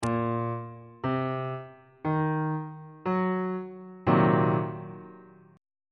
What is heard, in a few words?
d sharp minor 6th 2nd inversion on bass clef